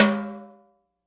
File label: classic hh Tim; STYLE KITS (Hip Hop, Etc); Classic Hip Hop Mega Kit